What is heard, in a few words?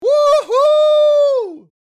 Cheering male support